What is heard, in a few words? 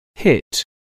hit